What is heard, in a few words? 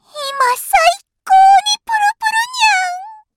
applause; osu